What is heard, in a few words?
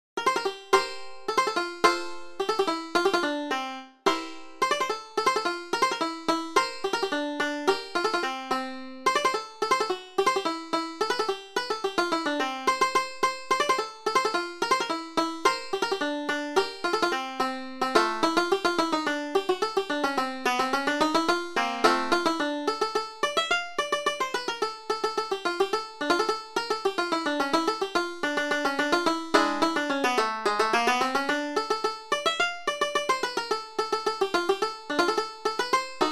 Polka in F